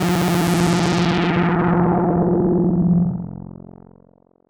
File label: Synth FX